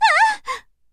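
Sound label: woman scream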